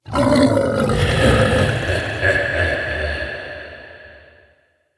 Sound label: Corrupted Arena Crosser Taunt, Animals; Bromius